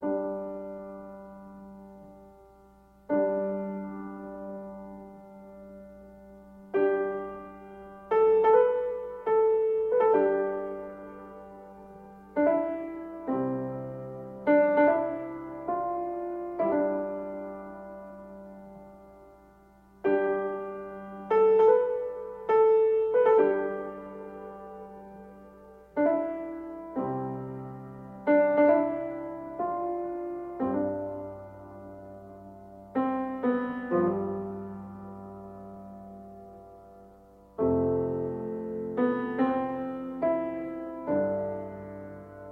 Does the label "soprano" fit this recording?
No